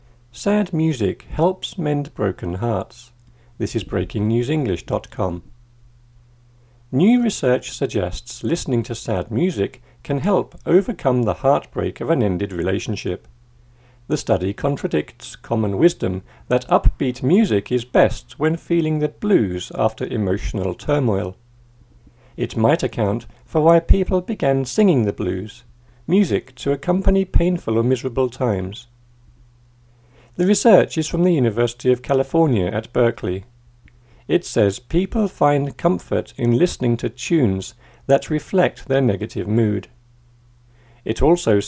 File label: sad music